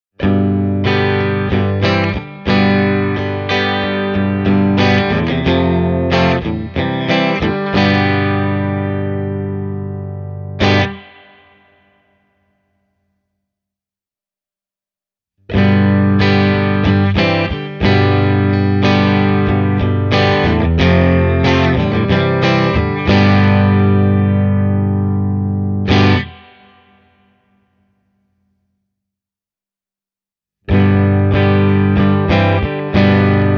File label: brunetti singleman 16 tele hi vol 10 oclock preamp modes; Fender Telecaster (’62 Custom Reissue); The guitar is plugged into the Hi-input, the Volume control is turned to 10 o’clock